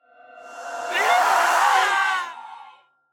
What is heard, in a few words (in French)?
Banshee Cri; Phasmophobia